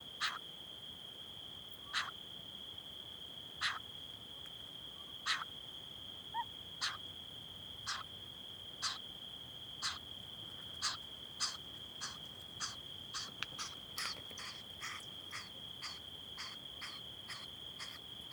Cyprus Scops Owl Begging of juvenile excitement calls of adult